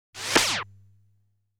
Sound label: Dragon Seeker Shot